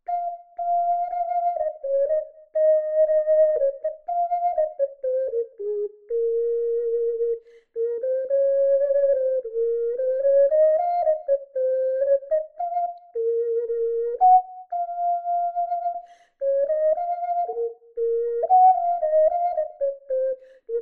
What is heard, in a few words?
Gis Merseburger Zauberspruch, ocarina